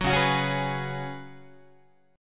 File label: Db 7th Suspended 4th Db down Guitar; strummed